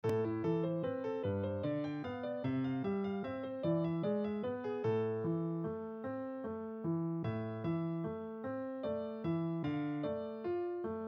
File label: piano